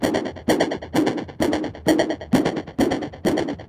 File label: Modular 130BPM